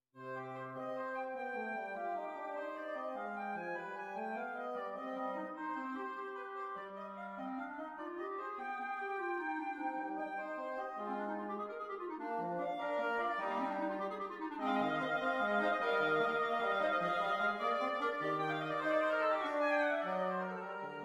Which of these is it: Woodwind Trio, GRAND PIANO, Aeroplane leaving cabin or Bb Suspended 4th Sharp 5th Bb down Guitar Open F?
Woodwind Trio